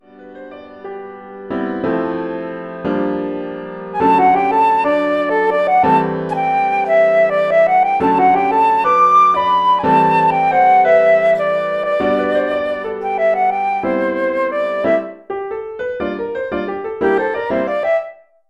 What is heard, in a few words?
flute solo + PA